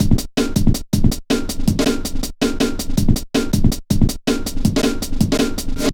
metallic stepz, drum loops

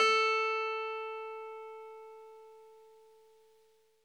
CLAVINET A4; Big Fish Audio - First Call Vintage Keyboards

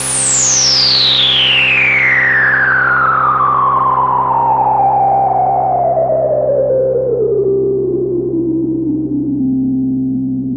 FX, Drum & Bass

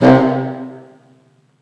Brass 5 - C; 99Sounds; Monosounds; Music Loops; Instrument Oneshots

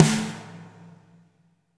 VRB SNR; Spectrasonics - Burning Grooves CD2

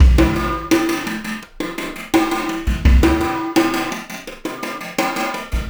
Beat, Processed Drumkits; Event Horizon

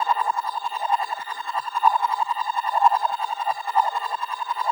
TRIPLE SWP; Distored Reality

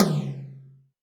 Tom Hi Flange, Alesis D4

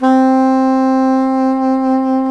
SAX SOP MF, Soprano Long

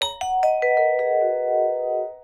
VIBES; Houseworx

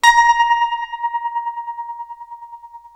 TREM A#4, Guitar, TREMELO; East West; Steve Stevens Guitar Samples Collection

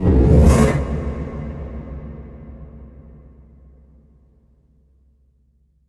impact flame, Cinematic Impact

